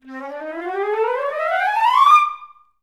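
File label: flute run up C3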